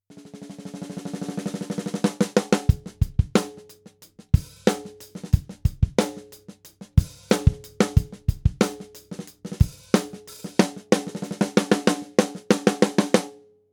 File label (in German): Messing mittel gedämpft